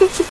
short gasping

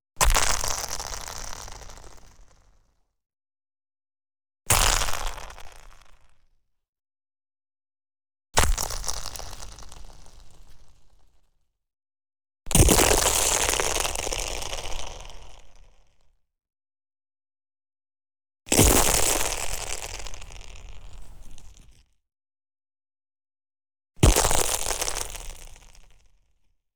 snow samples - stepping and crushing

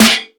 prominent steel snare drum sound a sharp key

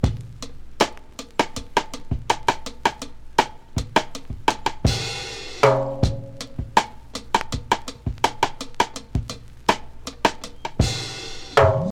81 bpm high quality drum beat A key

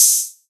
hot open hi hat sound g sharp key; Duration 0:01; open hat samples

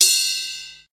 long tail reverb crash cymbal sound c key

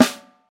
snare b key; snare drum samples

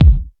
boom kick A key; kick drum samples; single hits one shots

